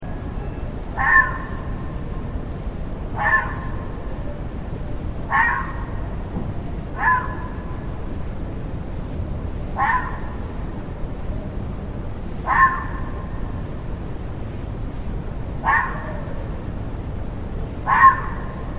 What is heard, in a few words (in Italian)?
volpina, bosco; Volpe notturna; Ascoltando il bosco